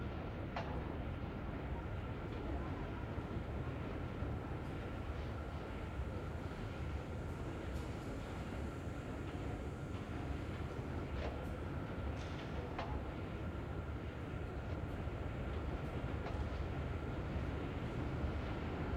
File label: amb undercity